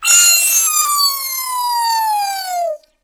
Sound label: Creature; Orphaned